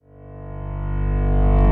piano fill

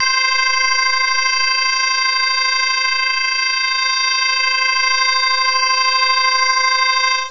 ring bell C5; 音MAD; 蓝蓝路

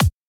kick

